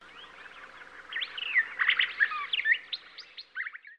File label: woodlands